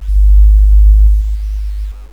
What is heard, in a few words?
jungle; Notrium